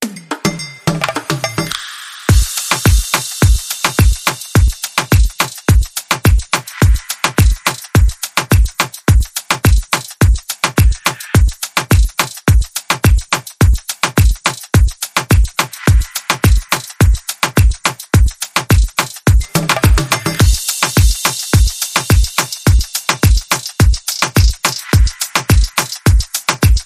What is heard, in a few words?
Dip Low Beat